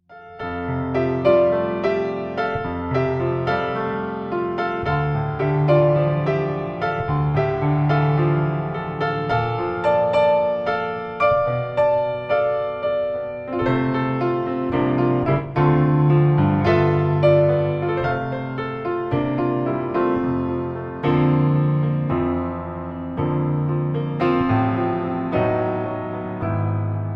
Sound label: Piano Meditation